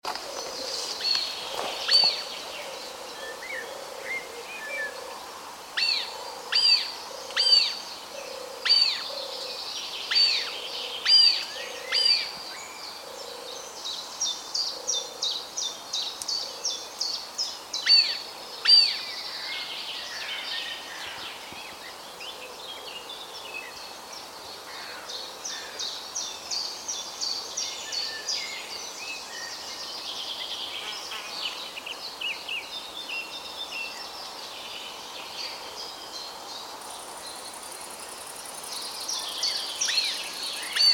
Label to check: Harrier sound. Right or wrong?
Right